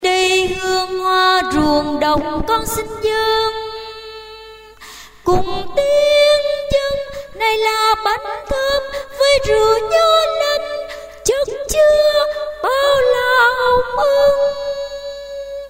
tenor

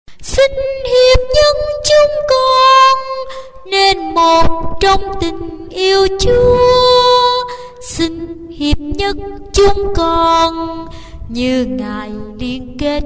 tenor basso

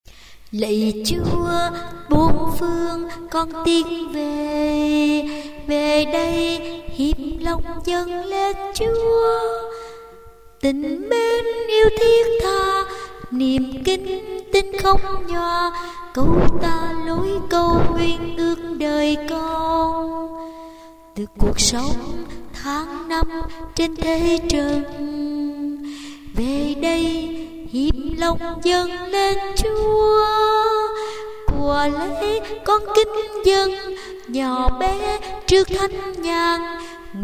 sop alto

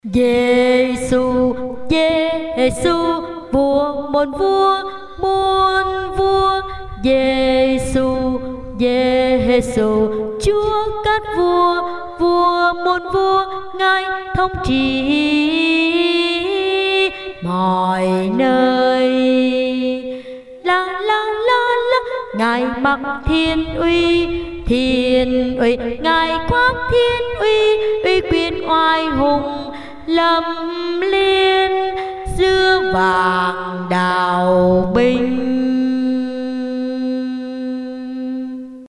basso

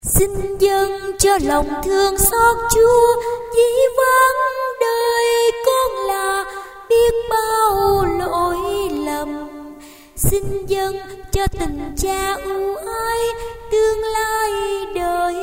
tenor basso

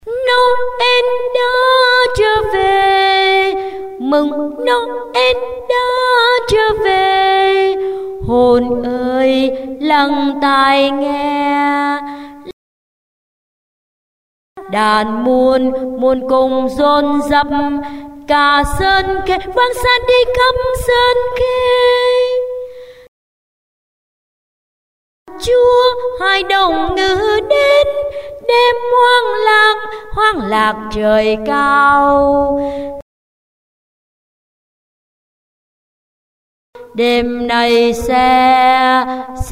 basso

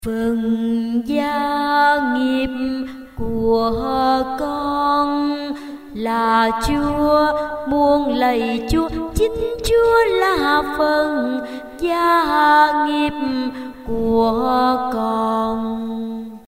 basso

